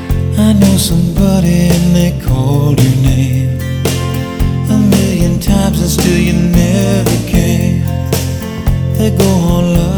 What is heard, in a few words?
blues echo